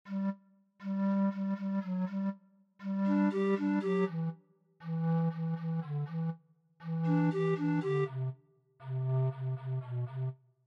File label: for panpipes